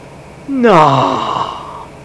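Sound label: "nawww", exclamation; AUSRUF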